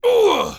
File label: pain; darkwing